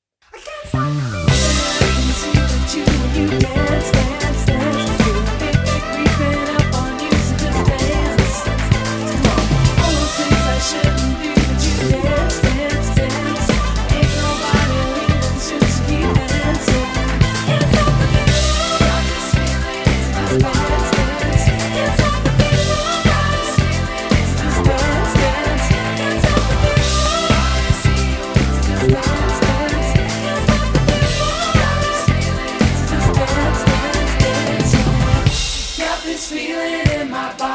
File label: Rock Pop Band; Staffs